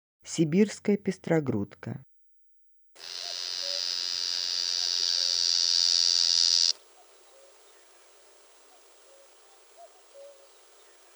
sibirskaya pestrogrudka golos

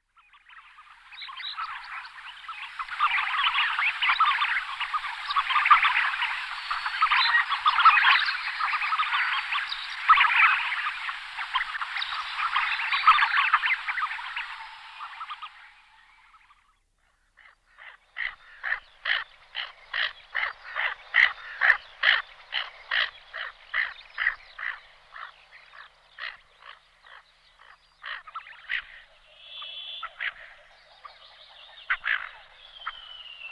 hohlataya chernet golos

